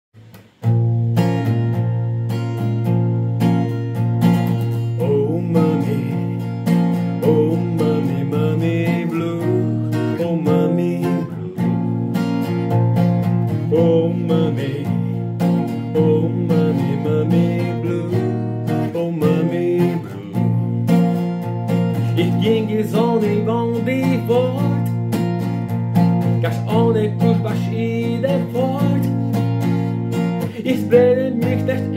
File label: german version; deutsche version